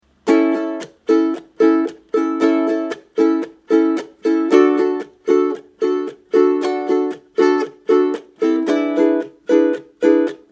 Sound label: Alternative Strum, ukulele